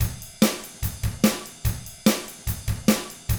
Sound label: rock drums